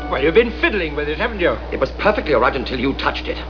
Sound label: fiddle